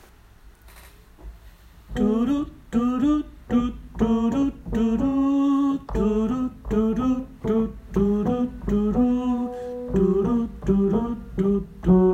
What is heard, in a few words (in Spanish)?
Alto